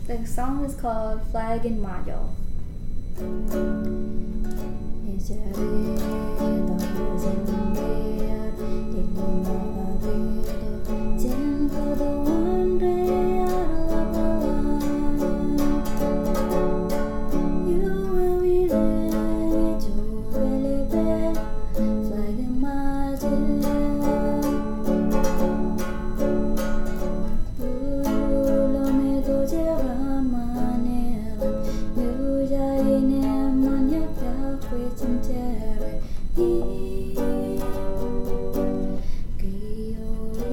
Song, This is a song about the Marshallese flag; titled Flag in Majol

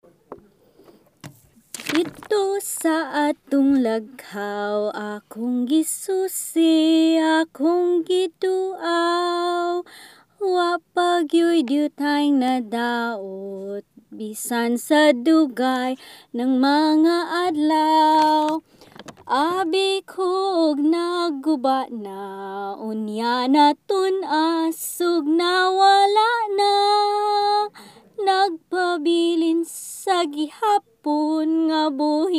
Lagkaw Song, BISAYA - Song; Didto sa ato--ng lagkaw; There in our first home

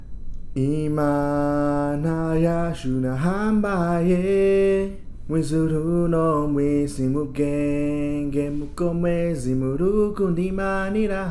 song, Kirundi

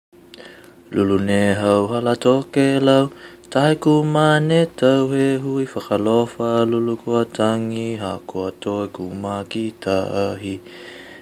Song, Niuean

